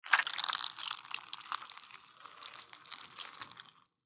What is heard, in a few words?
Vampire Suck Blood Creature Bite Squish Squeeze; Horror; Monsters; Blastwave